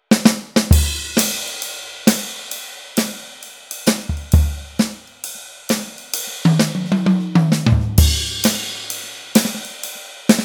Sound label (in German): gewa G5 Modul Jazz Kit